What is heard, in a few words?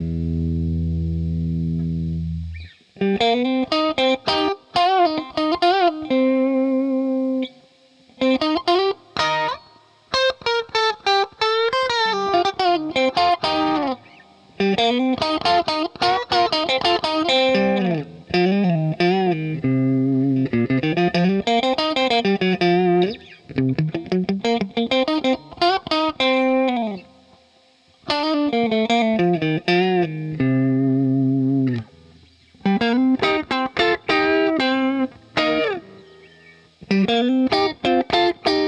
Strat61 first Test